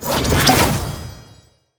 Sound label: hero stun success; wukong princess